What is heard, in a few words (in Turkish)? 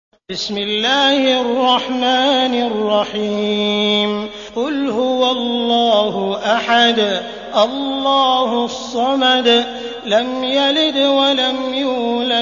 ihlas, kuran; sudeysi sureler